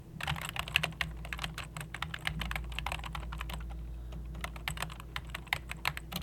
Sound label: Typing